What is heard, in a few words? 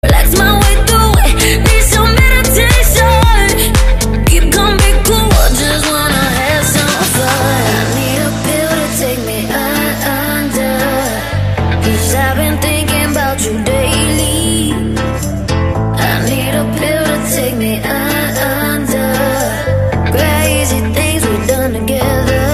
electro ringtone